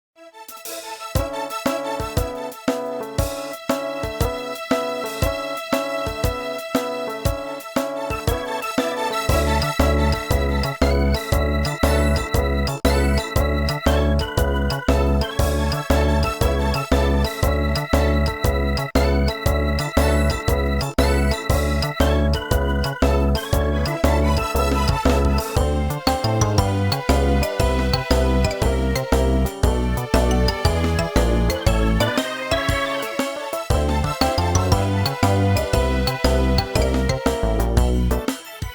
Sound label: Map Music; Fountain Plaza; YuGiOh Over the Nexus